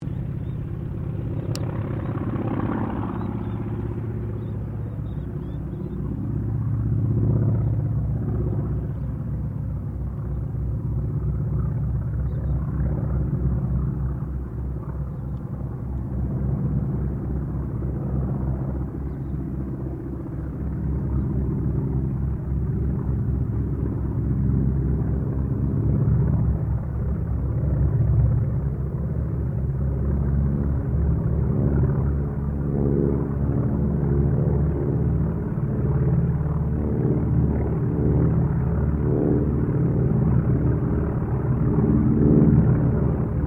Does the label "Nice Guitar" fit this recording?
No